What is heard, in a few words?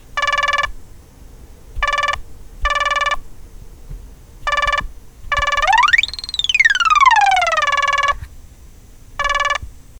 monotron